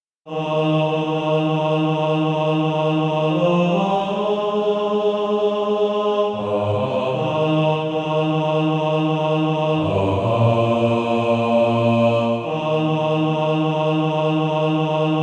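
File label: bass, Practise Music; Darling Nelly Gray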